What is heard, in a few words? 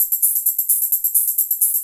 drum 130 hihat, Techno Trance, Drumloops; Techno Trance Essentials